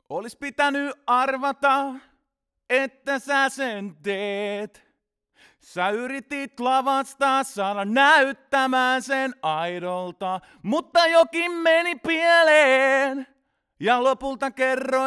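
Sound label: male vocal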